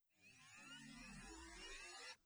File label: Energy Up; Game Kit Controller